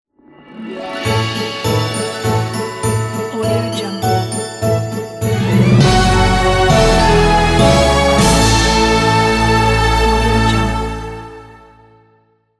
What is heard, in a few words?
christmas logo